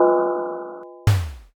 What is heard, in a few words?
iron door